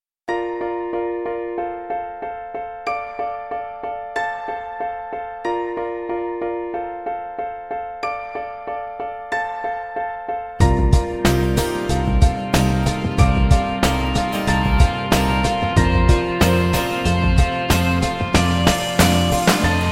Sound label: Cool Melody